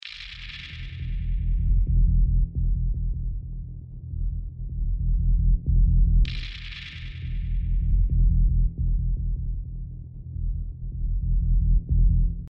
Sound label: bass drums cowbells tambourines; buhre; casanora